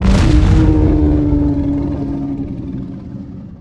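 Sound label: death, charger; giants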